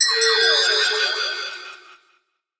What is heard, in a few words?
allay idle without item; mob; minecraft